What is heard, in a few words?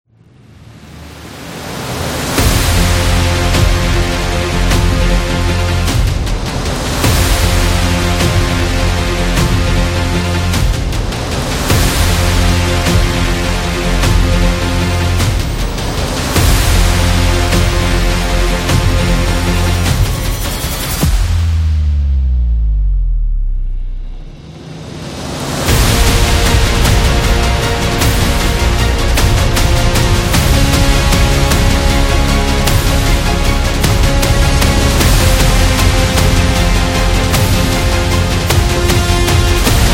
Powerful Epic Trailer